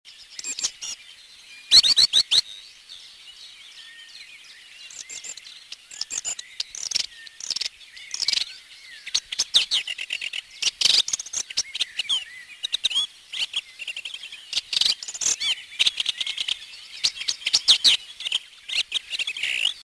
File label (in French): pie grieche ecorcheur, chant